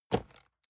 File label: cardboard box medium place soft; objekte